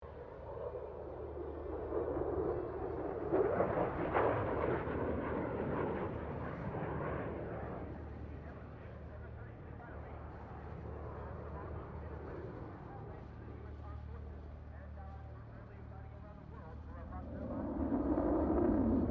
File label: AIRCRAFT F16 FALCON PASS; Airplanes; Vehicles